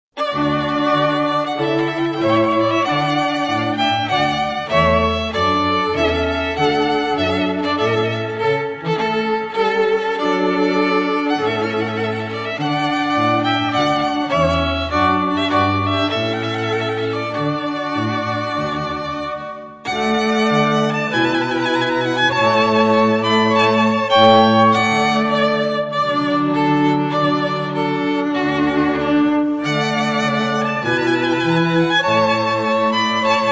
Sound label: festiva strings